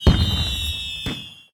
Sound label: Fireworks; virtual city windows gamerip 2009